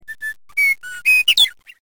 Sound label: Western Meadowlark